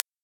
Clic Seul

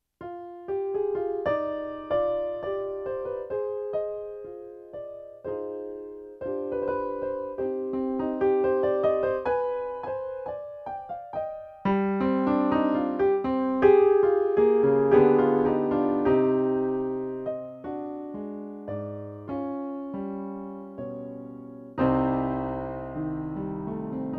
Morceau De Piano Dans Un Style Presque Romantique

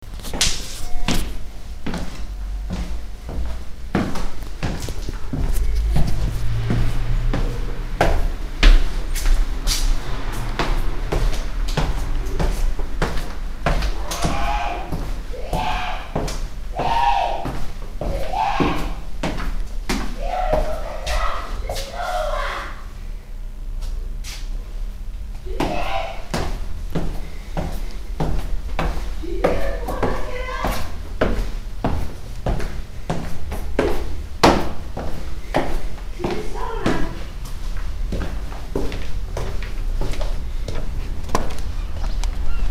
Hurlements

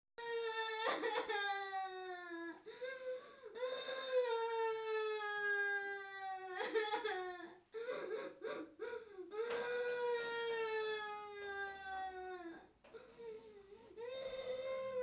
Pleurs Enfant

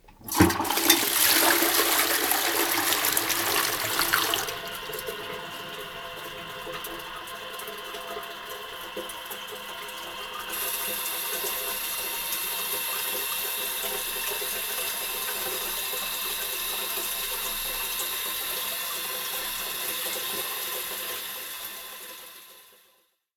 Toilette

